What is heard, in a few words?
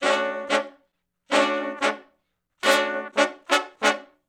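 R&B Riff (Bmin, Dmaj) harmony; Sections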